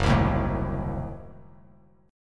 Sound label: Gb Diminished 7th; strummed; JGuitar